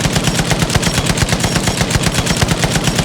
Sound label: gunner mg loop interior, weapons; planes; gmod; lvs planes